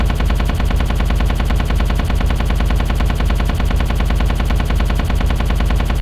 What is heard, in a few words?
plasma burst